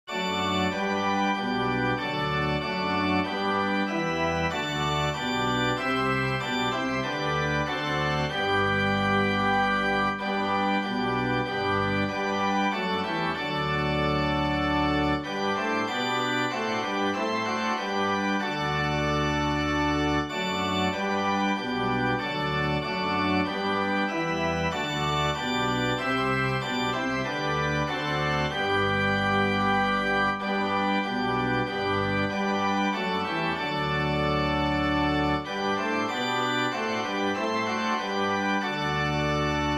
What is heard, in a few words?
stand up and bless the lord organ